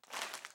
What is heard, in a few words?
ambience room shadow room working layer